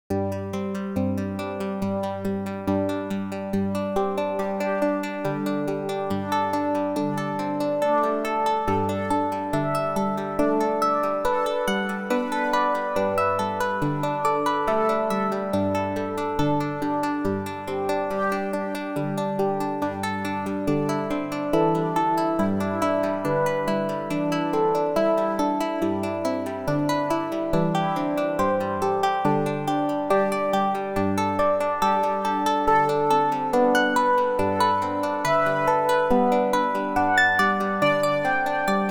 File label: Chill